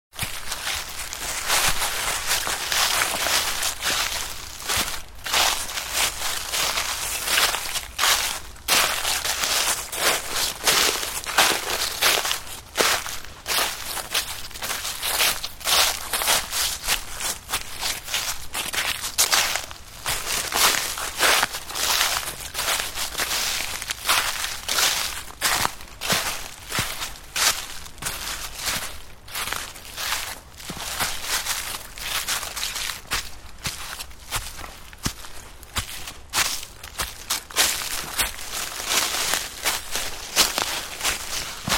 Walking on Frosty ground, Footsteps walking through frosty ground in woodland.; Snow (Sound Effects:Weather)